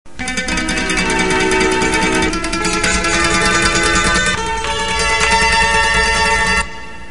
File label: mandolin